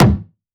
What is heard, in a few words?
kick hard; Drum Kit Kicks